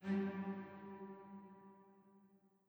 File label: chime old; chime sharp